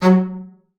F#3, bass spicc; Xsample VOL-5

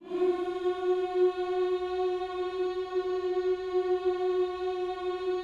HMMS F#4, gospel; Spectrasonics Vocal Planet

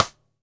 DW Red Maple Cross Stick; Sonic Foundry (Sony Creative Software); Vital Drums

